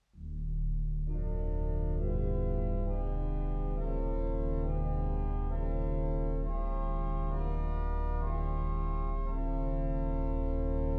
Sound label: psalm preludes, organist